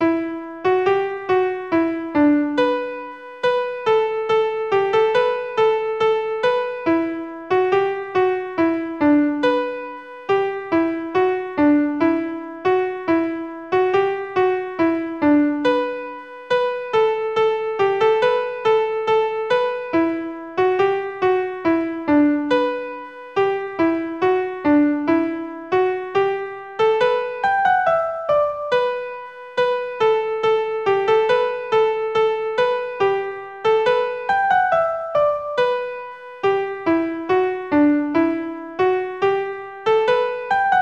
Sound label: Peat Fire Flame